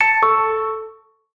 Alert, notifications; Green; Telstra

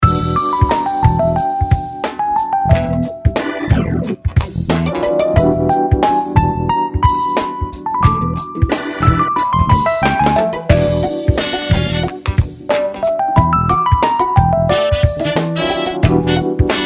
ringtong; Gionee V185

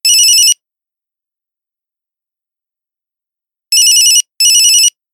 Wasp; X

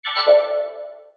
manner mode

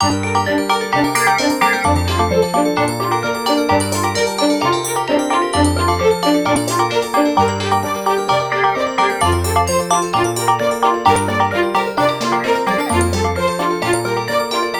Ringtone GirlTalk; Acer Liquid E1

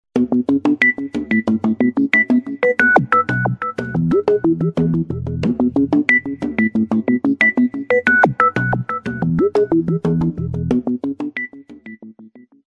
Low Beat, ringtones; Highscreen; Power Rage

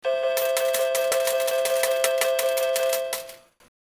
ringing tone; Nokia 6270; Casino

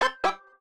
Ducky, notifications; Samsung; Galaxy A56; Fun

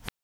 list reorder; TouchTone

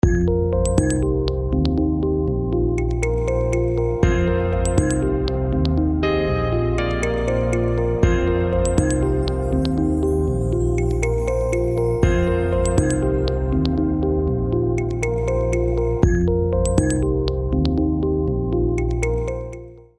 Loop; DEXP ML2-5; Stonex

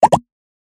Notification Xylophone; Xiaomi Mi 5 Android 8 MIUI 10

